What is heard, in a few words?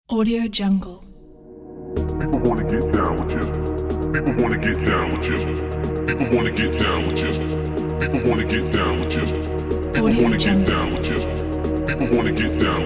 techno party club energetic house